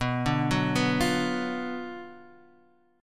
F sharp Major 7th Suspended 4th Sharp 5th, B, chord